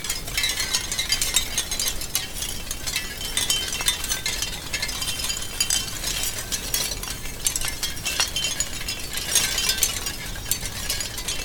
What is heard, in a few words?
wind chimes of shells; OpenValley